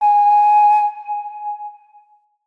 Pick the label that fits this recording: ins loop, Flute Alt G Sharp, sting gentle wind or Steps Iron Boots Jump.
Flute Alt G Sharp